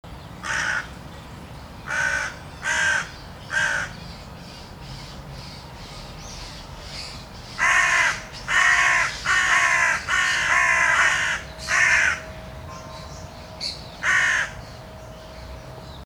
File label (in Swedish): Kraka